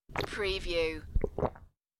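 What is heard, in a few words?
Sip Swallow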